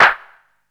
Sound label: large room snare drum g key, steel snare drum sound tuned to the G note